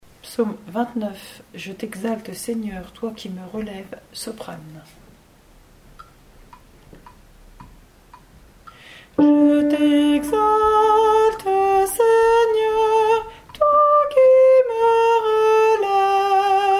Psaume 29 Je t'exalte Seigneur Toi qui me relèves SOPRANE

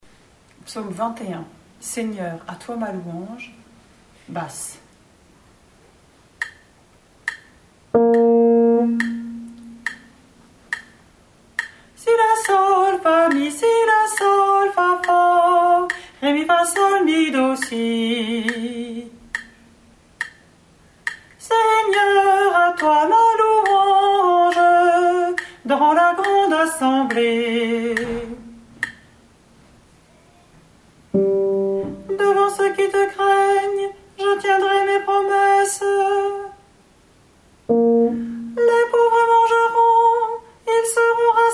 Psaume 21 Seigneur à toi ma louange BASSE

